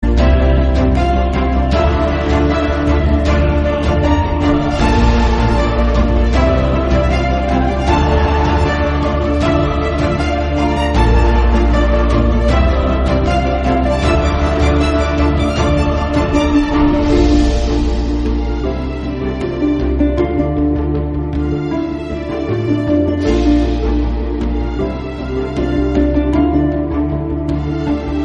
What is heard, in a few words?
orchestral cover instrumental